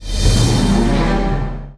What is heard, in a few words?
Stinger; Ben 10 Protector of Earth; Wii